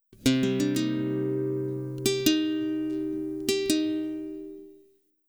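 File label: Soul Acoustic Guitar C; R&B Funk Soul; Digidesign; Pro Tools; Pro Tools Essential Demos; Essential Loop Demos; Demo Session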